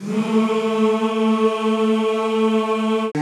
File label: CHO A2; Sound & Vision; Gigapack I CD 2; CHO Zzzz + Vvvv; CHO Vvvvv